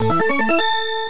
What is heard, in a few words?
Power Up; Gomola Speed; PC Engine; Green Ball